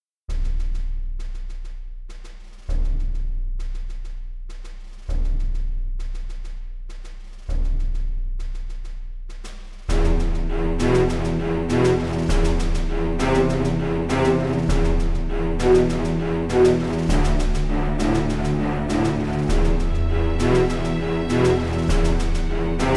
intro metal

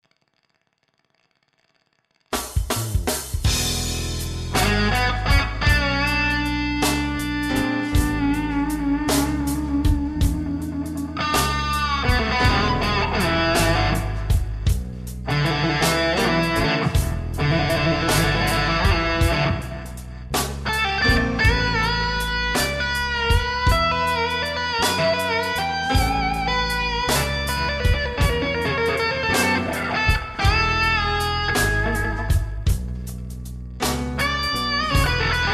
blues

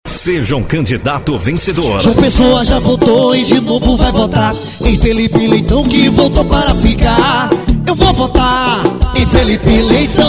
jingles; jp homestudio agosto

